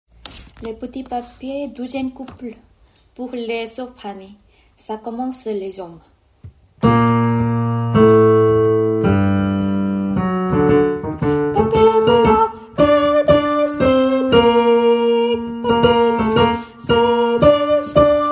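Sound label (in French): soprano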